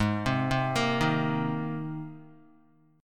G sharp Major Add 9th G sharp, Guitar; G#add9 arpeggiated; JGuitar

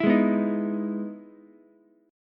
E Major 7th E down Ukulele; EM7 strummed; JGuitar

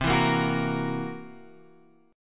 C 7th Flat 5th, Guitar, Open G

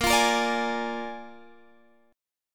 A sharp 7th A sharp, Guitar, Open G

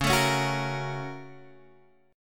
A sharp Minor Major 7th Sharp 5th

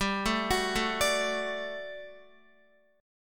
G Major 7th Suspended 2nd G down Guitar; arpeggiated; 4F,4A,5C,5G,5C,6E

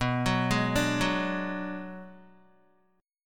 B Minor 6th B, Guitar; Bm6 arpeggiated; 6,9,8,7,6,x; JGuitar

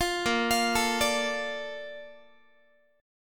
A sharp Major 7th, Guitar; arpeggiated

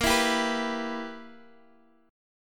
E-Minor-Bb; Em/Bb; strummed

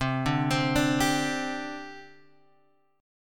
C Suspended 2nd C Guitar Open G

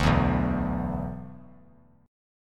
B-Minor Double Flat 5th; Bmbb5 strummed; JGuitar

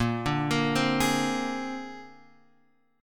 A sharp 9th A sharp Guitar Open F; arpeggiated; JGuitar

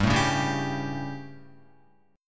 G Suspended 2nd Flat 5th chord, Guitar; Gsus2b5 strummed; JGuitar

